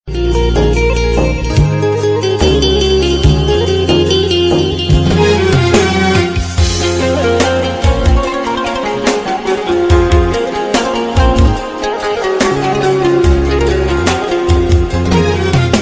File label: arabic melody, ringtone